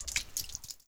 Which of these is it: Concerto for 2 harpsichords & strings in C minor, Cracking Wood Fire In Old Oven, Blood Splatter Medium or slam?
Blood Splatter Medium